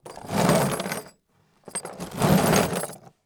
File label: Cargo Shakes And Rattles; Foley